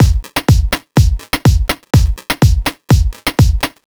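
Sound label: 124 Wubblefunk Bass Drum Hats, Drum Loops; 124bpm; Nu Dance Electro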